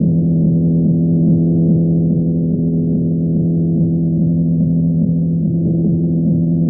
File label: breakneck drone, electronic; Loops